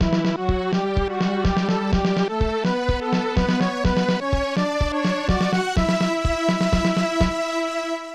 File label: stage complete; Avatar The Last Airbender The Burning Earth; GBA